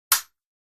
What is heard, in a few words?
Fencing Sword Hit